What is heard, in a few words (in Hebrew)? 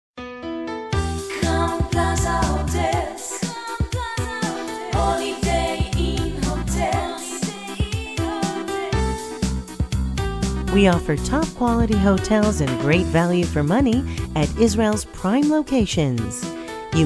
מוסיקה בהמתנה